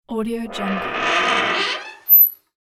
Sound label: iron creaky door short